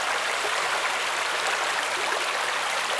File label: fountain